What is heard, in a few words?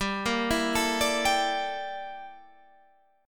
G Minor 6th Add 9th G down Guitar Standard; Gm6add9; arpeggiated; JGuitar